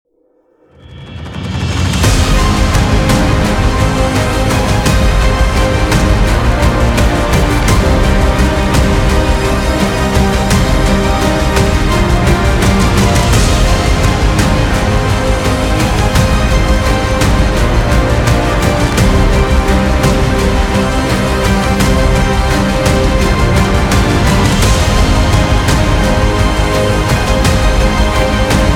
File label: epic cinematic drama